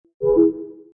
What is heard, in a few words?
facetime call end; Системные звуки и уведомления iOS 18 (iPhone 16, 16pro, iPad)